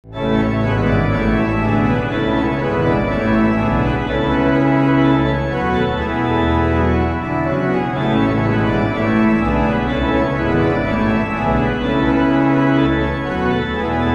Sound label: organ; bach; dpa4006; microphone test with organ